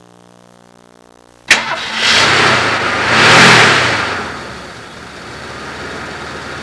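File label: starting; Transportation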